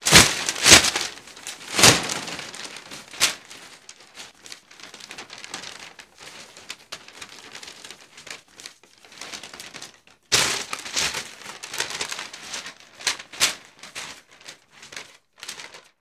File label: Paper Rustle Sweetener; Home & Office; 1001 Sound Effects